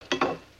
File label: Chest Idle